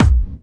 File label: BD Clubby; VEC1